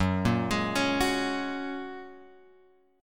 F sharp Major Add 9th; F#add9 Chord {2 1 X 1 2 2} arpeggiated

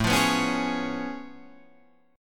A Augmented Major 9th A down Guitar Open D

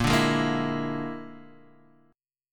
Bb 7th Flat 5th Bb; Bb7b5; strummed; JGuitar

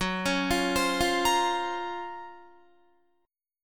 Gb Major Sharp 11th Gb, Guitar; GbM#11 arpeggiated; JGuitar

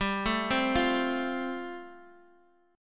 G 7th Suspended 2nd Suspended 4th, Guitar DADGAD

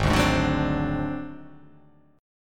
G sharp 7th Flat 5th G sharp down, chord

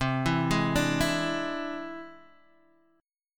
E Augmented 7th C

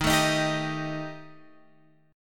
D sharp Major Flat 5th

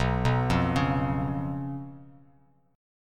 B Suspended 2nd Flat 5th, Bass Standard chord; arpeggiated; JGuitar

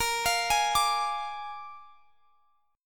Bb 7th Bb down, chord; Bb7 arpeggiated

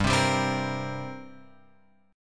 G Minor Double Flat 5th, G; {3 3 5 5 X 6} strummed

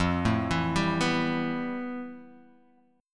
F Major Sharp 11th, Guitar, Open D

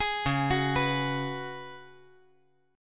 C sharp 7th Suspended 4th C sharp

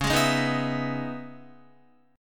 C sharp Minor 9th C sharp Guitar; C#m9 strummed; JGuitar

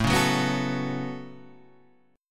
A 6th Add 9th A down Guitar Half Step Down

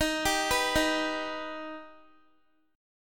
Eb Augmented, Guitar; x,18,17,16,15,x; 4E,4A,5D,5G,5C,6F; arpeggiated

